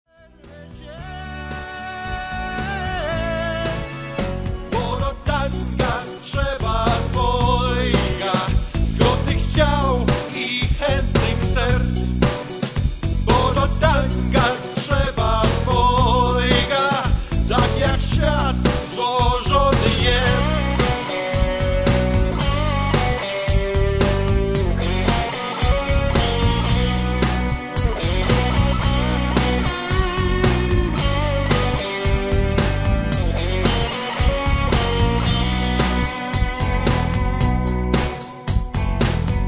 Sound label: tango